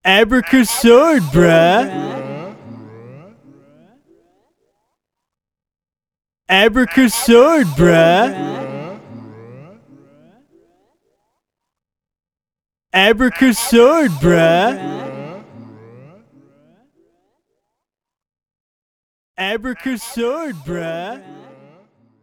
swords

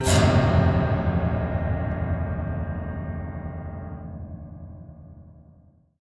Piano Bad Note Stinger

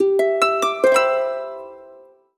Harp

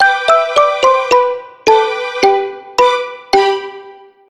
Jingles Inn

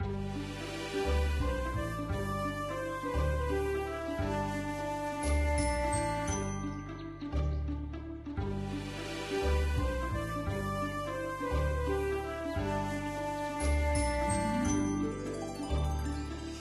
Music Hybrid; Pet Rescue Saga; android ios mobile windows gamerip 2012

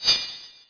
magic missile hit; hero nivus

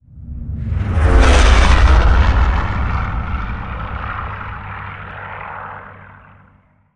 Vehicle Plane Bombing

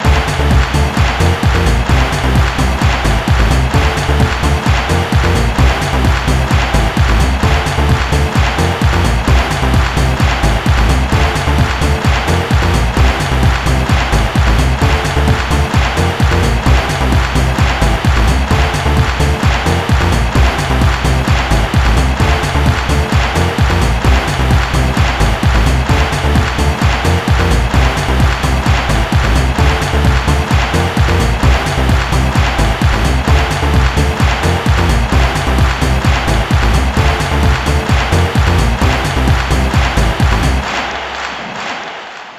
Goal Theme - Home Win; super mario strikers gamecube

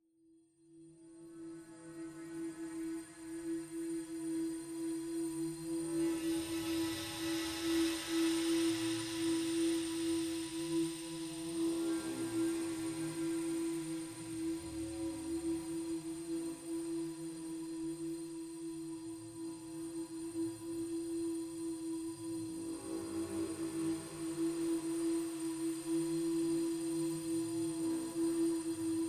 Suspence Pad, Low Intensity

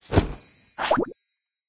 Popup No Goldbars; candy crush saga gamerip

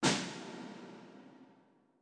orbit snare; Sky Children of the Light gamerip

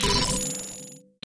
correct code

